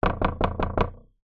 household wood door loose knocks hard x5; zapsplat

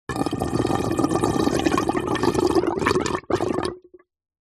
water pipe gurgle; nature

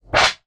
tin lid down on floor; foley; zapsplat

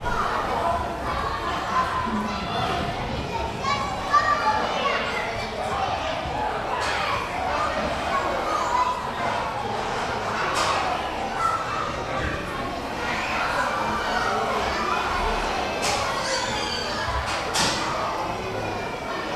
ambience childrens indoor play park uk; sound effects one

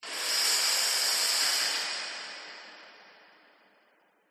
AIR HISS RELEASE DISTANT

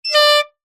sport air horn 1x blast; zapsplat

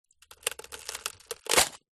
nature ice block thin pressure crack

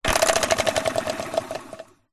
leisure toy plastic spinning drum spin

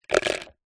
office pencils drop into plastic large pot

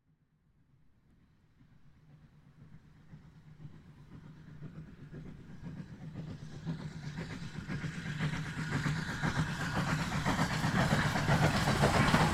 steam train pass close by; transport

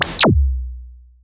Zap Laser Blast; bottlerocket

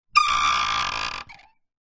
wood handle turn creak; foley

